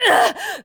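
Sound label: woman scream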